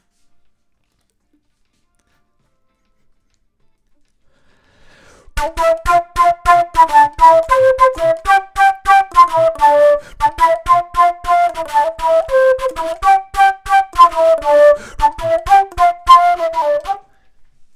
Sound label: flute